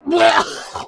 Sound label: vomit male; Earth and Beyond; EA Games